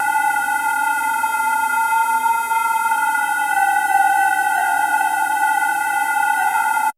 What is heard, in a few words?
RING MOD; ATMOS